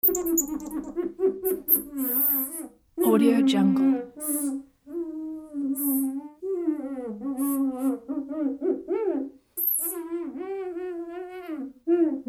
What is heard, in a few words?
squeaks